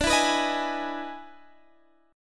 E Major 7th D, E/D Major 7th chord

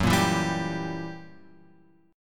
F sharp 7th Flat 9th F sharp; F#7b9 Chord {2 1 2 0 X 3} strummed

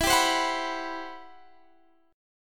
C Major Sharp 11th E

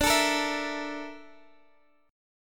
Bb Major 7th Flat 5th

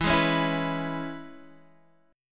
E 6th, Guitar, DADGAD; chord shape

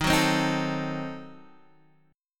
Eb Diminished 7th Eb, Guitar Half Step Down

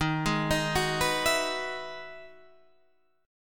E Major 9th D sharp, chord

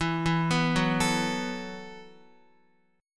E Suspended 2nd Suspended 4th E, down, Guitar; arpeggiated; JGuitar

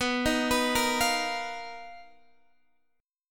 B Major 7th Flat 5th B down Guitar DADGAD

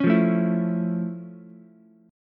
F Augmented Major 7th F Ukulele; F+M7; strummed

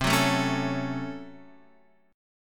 Db Major 7th Suspended 4th Sharp 5th C

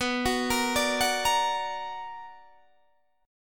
B Minor Major 7th, Guitar, Open D

